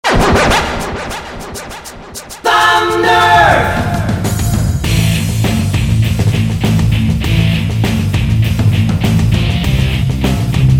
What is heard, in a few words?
The Cool Jazz Sound